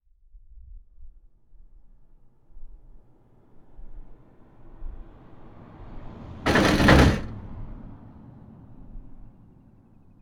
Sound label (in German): Faskrudsfjordur einfahrt; Ristarhlid